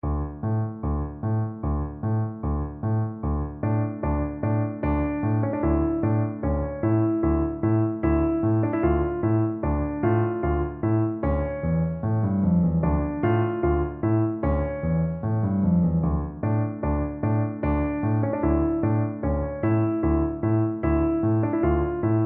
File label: danses des cygnets, Tuba